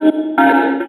tonal oneshot C#; Pop; Slate; PASADENA Pop Sample Pack; Tonal One Shots

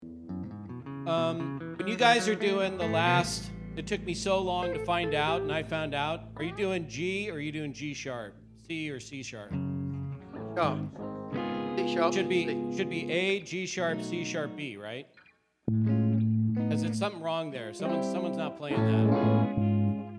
Vocals

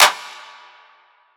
clap old vhs; Drum One Shots; SD MIDNIGHT Sample Pack; Slate; Claps

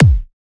kick whack, Drum One Shots; SD MIDNIGHT Sample Pack; Slate

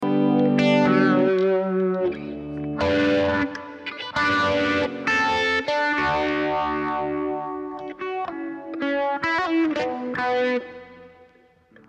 Strings

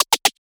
snare triple, Hip-Hop Sample Pack, Drum One Shots; Drums; Slate; CAICOS Hip-Hop Sample Pack

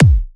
kick; Slate; SD MIDNIGHT Sample Pack; integra

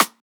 snare oneshot, Pop Sample Pack; Drums; SD PASADENA Pop Sample Pack; Slate

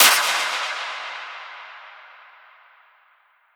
Clap, 909; Slate; Vintage Drum Machines Volume 3 909 Edition

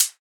hat lofi, drum one shots; Drum Sample Pack; Hats; Slate; SEISMIC

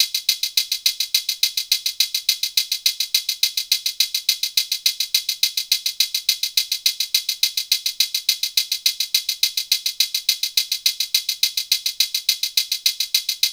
hat 105, Hat Loops; Drum Loops; SD MIDNIGHT Sample Pack; Slate

